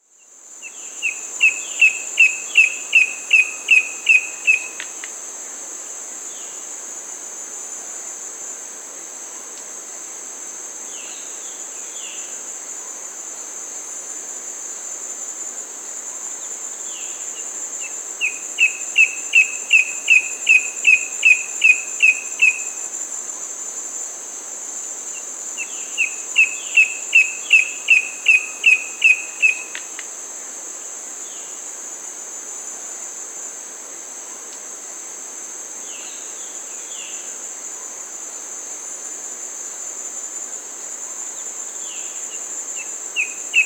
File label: Bamboo Antshrike (Cymbilaimus sanctaemariae), Peru; S. America